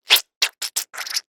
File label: human puss squeeze squirt